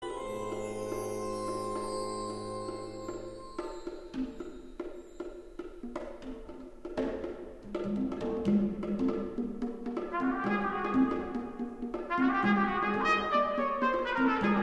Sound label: Concerto For Trumpet Wind Ensemble, Allegro Giusto